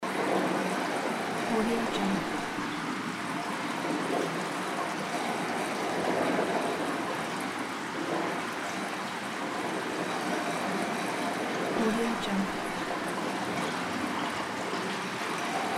small water pool loop

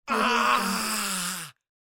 male yell

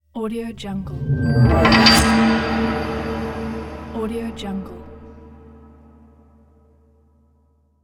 east asia gong

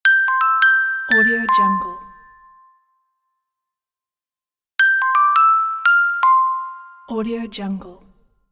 happy bells melody logo

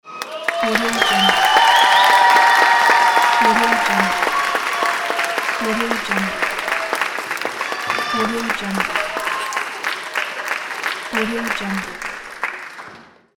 applause bravo

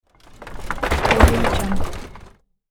horse drawn carriage jolt; hollywoodedge

